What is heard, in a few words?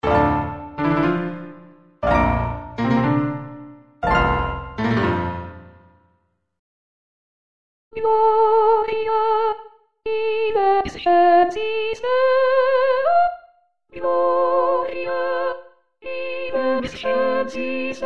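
Gloria Soprano 1; Rossini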